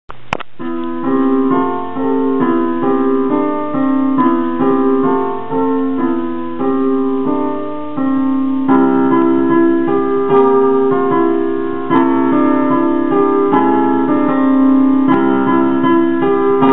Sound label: piano, Recital; holiday piece; Angels We Have Heard On High; Bruce